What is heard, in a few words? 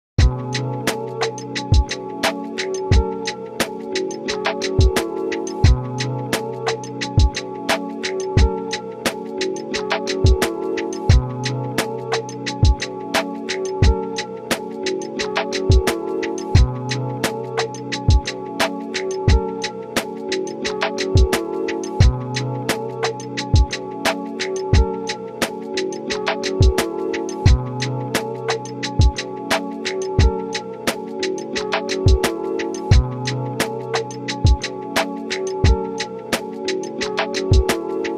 beat